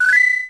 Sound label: Default Beep; 250 Desktop Themes